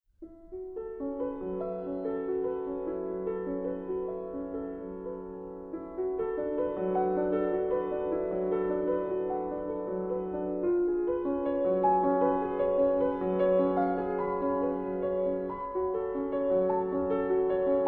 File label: toccata